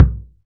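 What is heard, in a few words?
club Kick; Roland SPD-S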